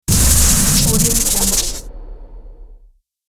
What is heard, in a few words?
short glitch noise